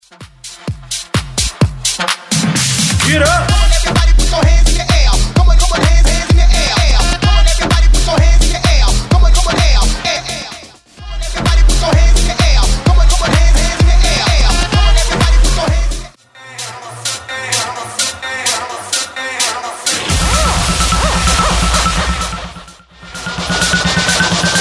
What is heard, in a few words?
Electro House 128bpm